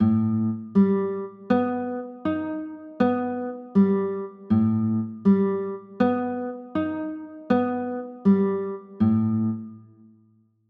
a sharp 6 9, chords; a 9 sharp 11

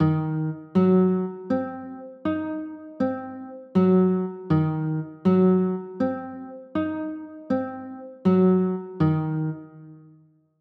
d sharp m6, chords

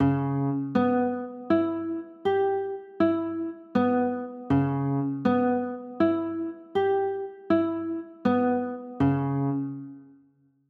c sharp m7b5

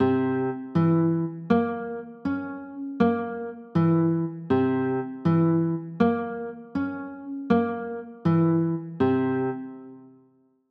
c 7 b9, chords

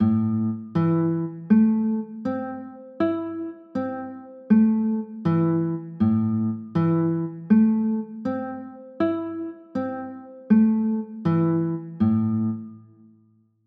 a m, chords

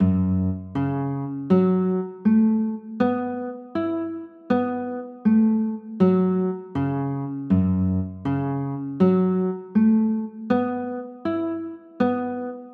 f sharp m11

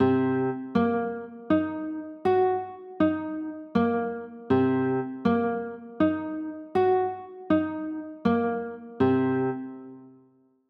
c m7b5, chords

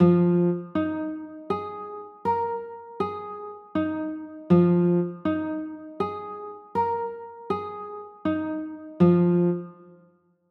f m11, chords

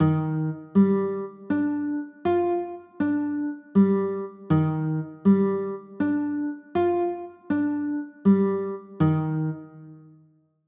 d sharp maj9, chords

